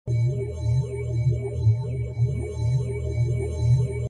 skill loop; rocker

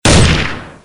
sound attack hit; hitsound

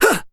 attack

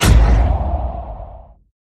skill bullet

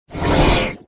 skill bullet; painter

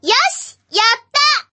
win; fight Result

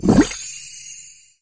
exp Up

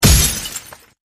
skill Fragment

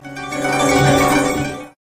skill bullet

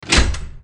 rune click

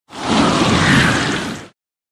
skill bullet

